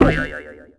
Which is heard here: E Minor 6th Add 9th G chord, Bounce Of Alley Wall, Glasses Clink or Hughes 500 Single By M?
Bounce Of Alley Wall